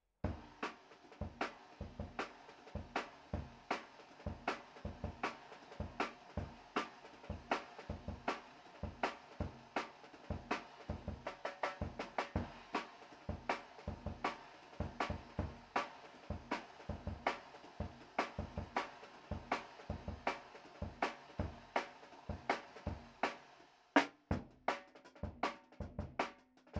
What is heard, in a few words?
TRASH ROOM, DRUM N BASS:JUNGLE BEATS; THE RAIN RIDE CYMBAL; RAW MULTITRACKS